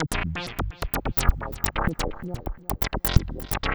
Lead

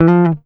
GTR TRIK, Jazzistic; Univers Sons

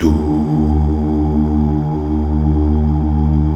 Mal Duh; Best Service Gigapack 1

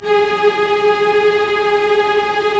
STR, Roland LA Composer

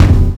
Adrenal Kick; Sonic Foundry (Sony Creative Software); Drums & Drones; Construction Kits

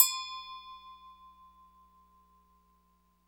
AGO, Latin; Roland - Rhythm Section; Latin 1

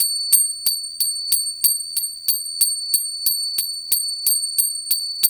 TRIANGLE, Funky Ass Loops; East West

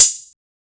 Hat Accent, 606; classic machines

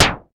Moog SD; Moog Modular55

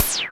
noise fx; Masterbits; Switch FX

